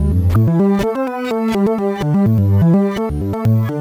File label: Lead